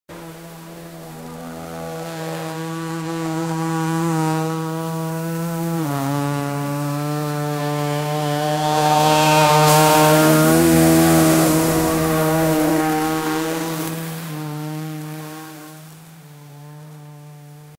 jet ski; جت اسکی

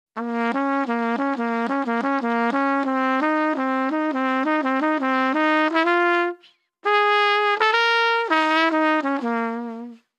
Trumpet

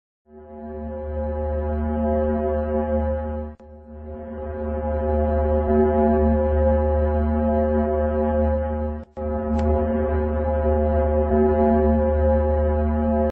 Venus; سیاره زهره

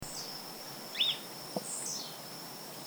Lesser elaenia; پرنده ابروزردک کوچک

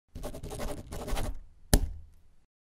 to sign

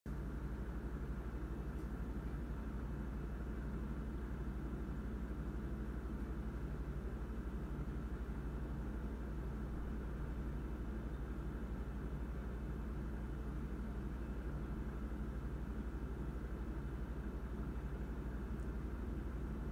corridor

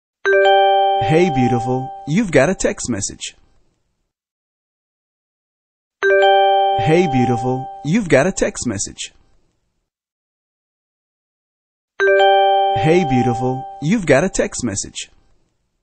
External speaker SMS ringtone; زنگ اس ام اس سخنگو خارجی

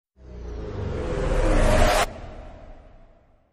crescendo

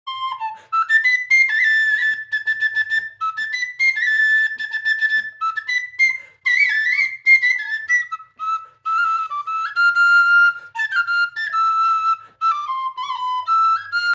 Shvi

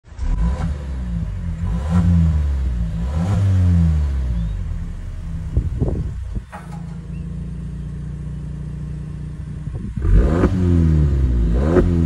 GAC EMPOW; اگزوز

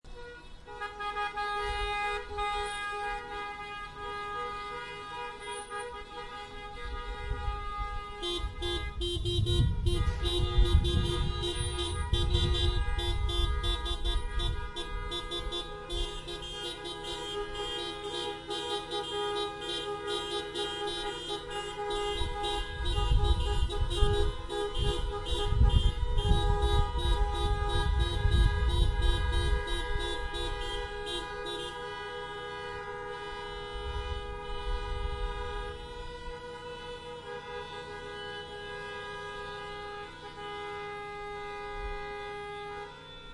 car horns in street protest outdoor

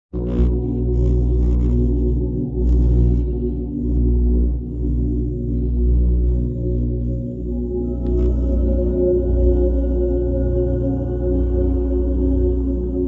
963 Hz frequency

